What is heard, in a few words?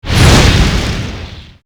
hit common burn